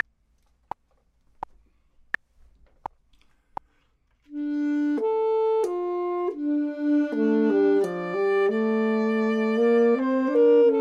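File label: both, Saxophone and Clarinet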